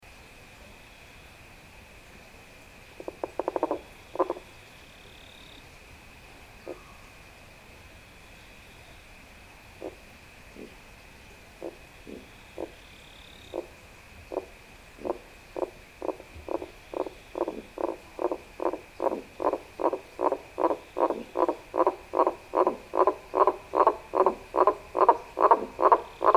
namiegaeru call, frog

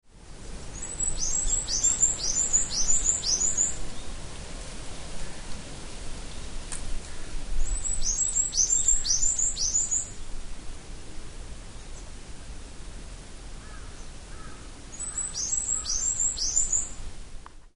shizyuukara call; yanbaru

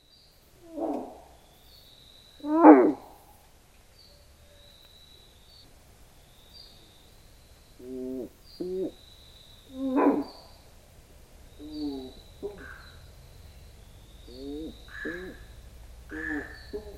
horusutogaeru, frog

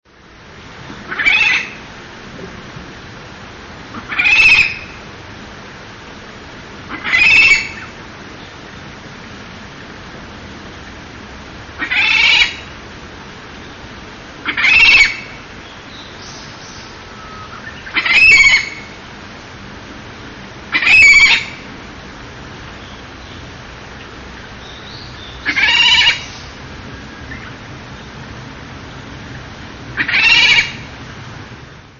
yanbarukuina sound

